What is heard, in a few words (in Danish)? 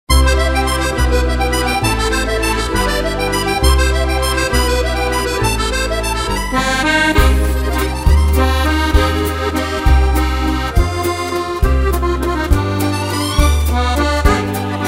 Harmonika fransk medley